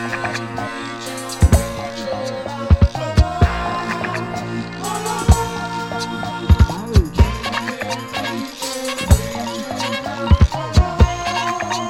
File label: Instrumental